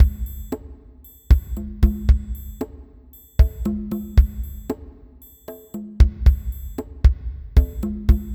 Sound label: Jazzy Rock Drums